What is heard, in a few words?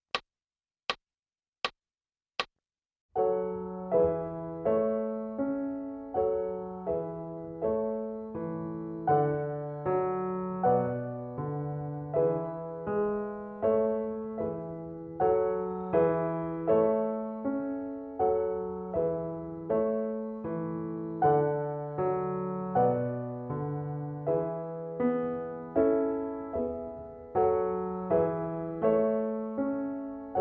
Piano